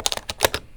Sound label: UI Comment Type